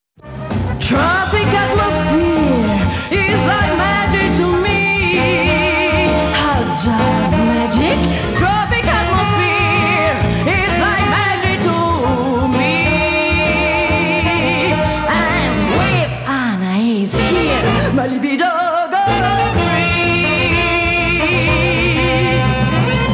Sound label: Singer